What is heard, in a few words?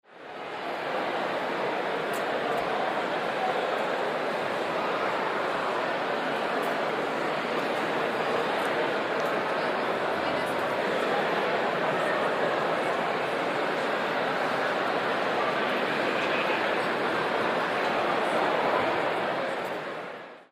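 cathedral function; norwich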